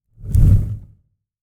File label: whoosh, ui whoosh